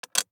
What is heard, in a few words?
DICE on Glass, Drop, Standard, Four Dice; Samples; ArticulatedSounds; DICE Sfx Party (Extended)